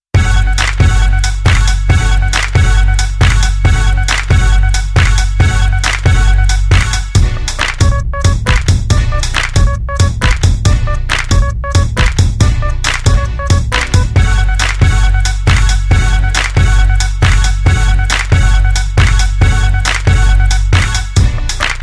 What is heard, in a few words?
Bass So Fine